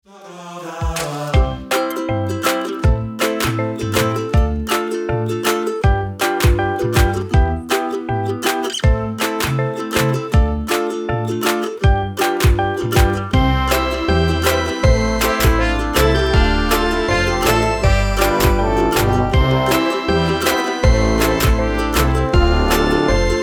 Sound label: share a little love instrumental